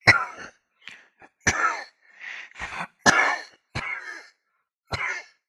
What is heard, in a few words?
fantasy giant male coughing; zapsplat